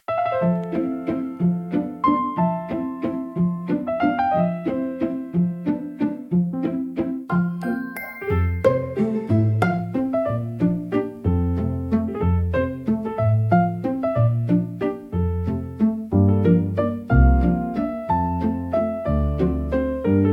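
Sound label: spring chime; krasivaya muzyika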